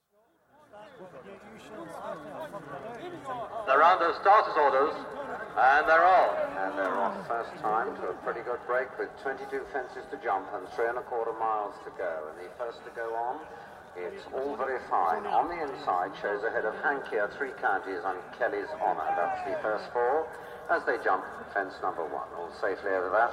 National Hunt Racing, distinct PA commentary on whole race, also crowd noise, etc, getting excited towards finish; Crowd Ext Excited; BBC